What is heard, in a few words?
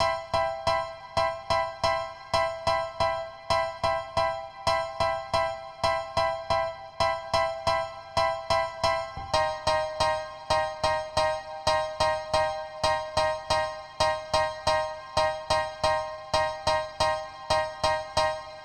Dance Piano L